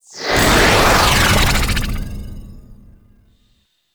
Magician Volatile Ability; Warframe

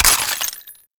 Ammo Pickup Default; Warframe; Frumentarius; Ammo Ability

